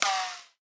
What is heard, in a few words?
bullet ricochet; Rust